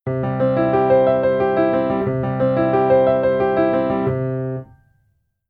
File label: Keyboard